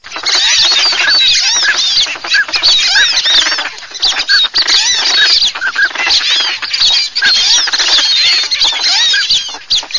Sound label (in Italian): MISTO